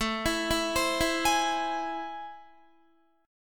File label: A Minor Major 7th, down, Guitar; arpeggiated; AmM7; JGuitar